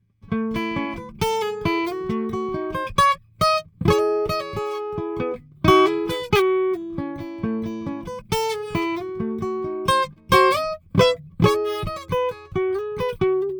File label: Taylor 314ce Earthworks QTC30